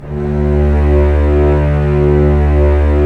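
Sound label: STR SC, strings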